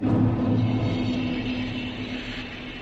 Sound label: new area sound; Dark Souls